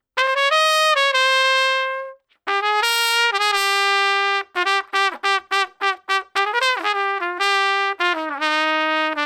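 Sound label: 205 Trad Jazz Trumpet Solo, F major; Brass; Pro Tools Essential Loops; Digidesign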